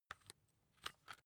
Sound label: rock drill head remove soft; maschinen; bohrer; soundpool